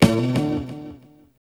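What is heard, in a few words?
guitar, Hip Hop R n B; USB Soundscan vol.19; AKAI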